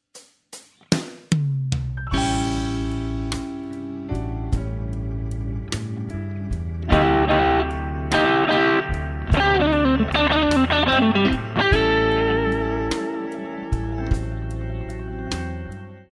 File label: Blues Lick, guitar; Blues Guitar Method 1